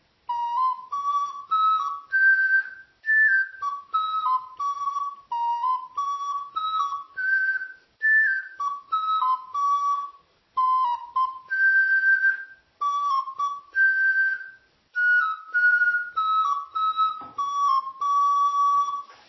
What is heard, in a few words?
pokeflute, ocarina